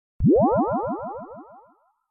Sci-fi alien warp granular tone

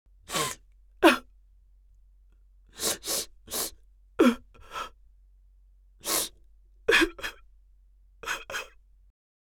Senior lady crying desperately

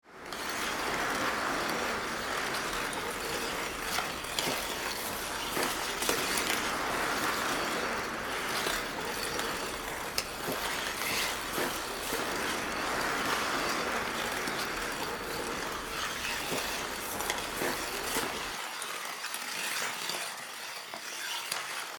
Ice hockey arena ambience skating no crowd

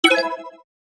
Online item purchase e commerce

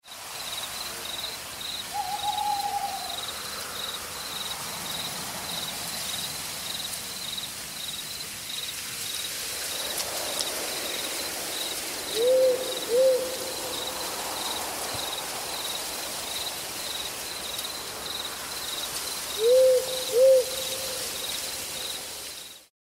Forest at night wind breeze birds and wildlife

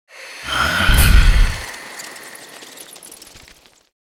Vampire transformation bats morph

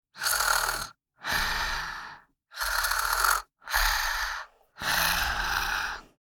Little girl sleeping light snoring; gfxsounds

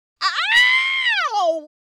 Witch fantasy vocalization pain hurt

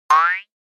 Cartoon spring boing jaw harp

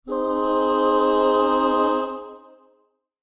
Heavenly choir swell

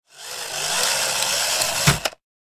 RC toy car remote controlled impact; rc toys

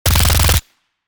Special forces submachine gun short burst

